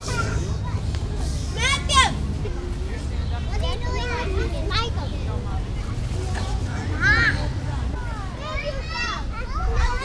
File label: Playground; tony schwartz